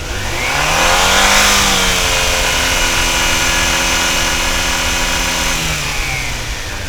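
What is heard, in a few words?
rev fade, Engines; E-MU Producer Series Vol. 3 – Hollywood Sound Effects; Ambient Sounds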